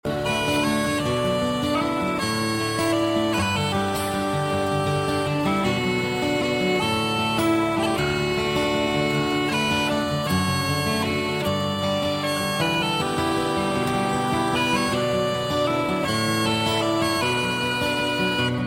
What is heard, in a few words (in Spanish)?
Gitana Musica